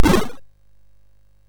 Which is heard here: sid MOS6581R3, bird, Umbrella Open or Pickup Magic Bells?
sid MOS6581R3